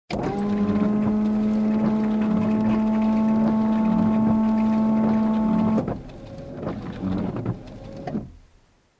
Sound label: Cleaning Windshield with Wipers